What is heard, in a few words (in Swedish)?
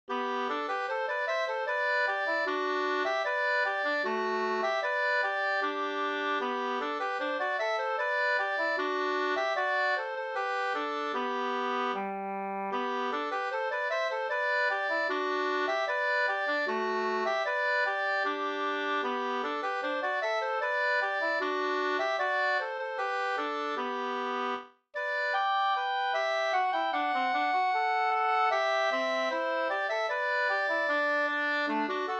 schottis; Ekno Up